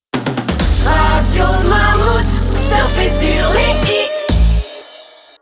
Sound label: Jingle rock